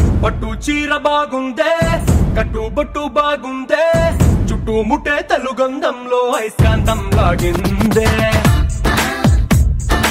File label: Telugu Ringtone